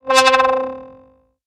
Techno Synth Shot C; Synth One-Shots; Ghosthack; 45 Free Techno Synth Shots 2025

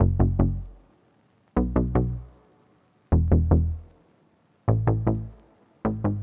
Happy Melody Loop - 154 BPM E Maj Bass; Melodies; Loop Stems; Cymatics; Oracle Sample Pack

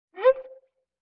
Violin Short Pitch Slide; Vintage Cartoon; Musical Elements

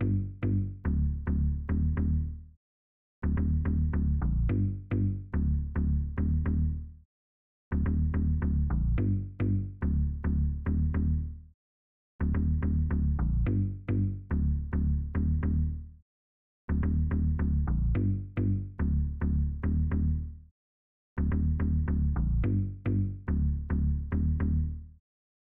Bass, 107 BPM G Min; Stems; Cymatics; Cymatics - CHAOS Beta Pack (MIDI + Stems); Santa Monica

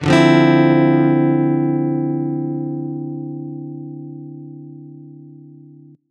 Guitar One Shots D9min; Ghosthack; Guitar Loops & Shots

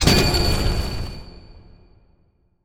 UI Earth Confirm; User Interface

